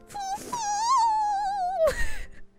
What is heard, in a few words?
fooofooooooow